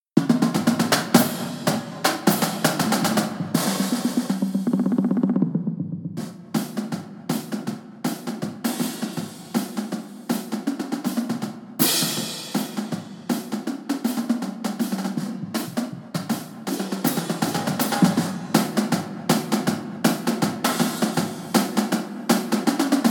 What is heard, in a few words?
drumline only